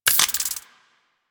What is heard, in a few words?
Modular Clip Large Mech, Weapons; Warframe; SU Modular Guns